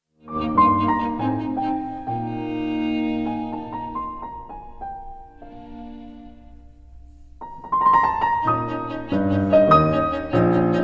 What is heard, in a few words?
variations for piano trio op 44 in e flat major; Ludwig van Beethoven